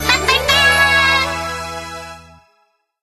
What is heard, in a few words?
bubble gacha result